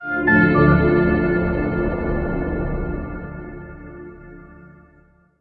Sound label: tree sting